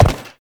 Step Perforated Metal; Warframe; Uriel

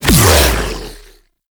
Regurgitate Impact B; Warframe; Grendel

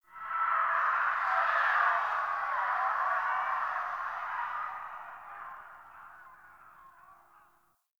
Distant Ambient Battle Cries; Warframe; Tau

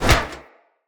Shield Catch; Weapons; Swords; Dendrit Sword And Board

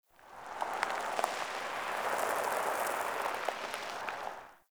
Tire On Cement One Off

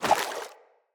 Grunt Step Water, footsteps; Enemy; Corrupted Sproutfolk

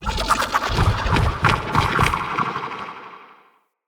Corrupted Slug Sub Boss Vocal Idle; Creatures

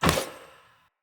Long Sword Catch; Weapons; Player; Pothia Long Sword; Swords

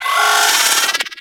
Drone Vocal Player Spotted; Warframe; Venus; Venus Terra Attack Drone

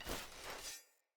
Short Sword Accent; Weapons; Player

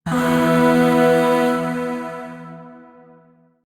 Shawzin Chromatic Chord; Warframe; Zariman Void Shawzin

